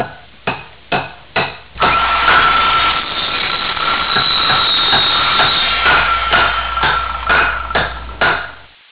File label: hammer saw